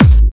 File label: Kick; live-beatbox